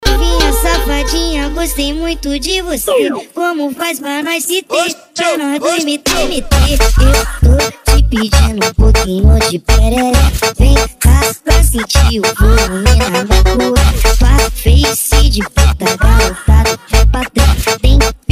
funk

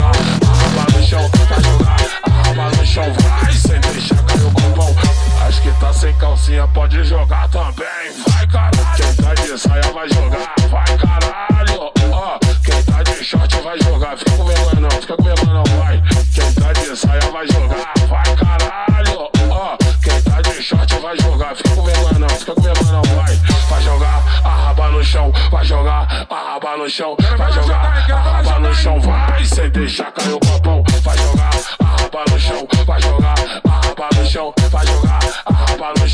funk bass

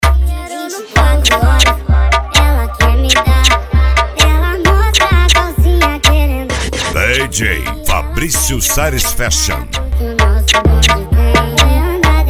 funk

